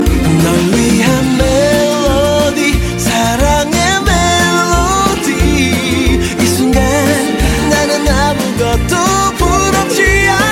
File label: melody for you